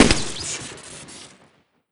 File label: burping blaster shoot crit low, weapons